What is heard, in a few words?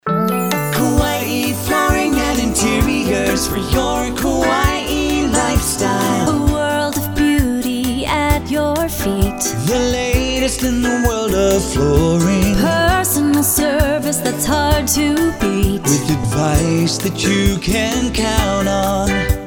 flooring jingles; Kauai Flooring Interiors